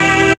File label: DR. LOOP; X-Static Goldmine 3; CHORDS